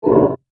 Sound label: Monster Grunt, Horror, Monster, Grunt, Creature